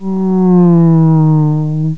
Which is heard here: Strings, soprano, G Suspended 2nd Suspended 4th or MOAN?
MOAN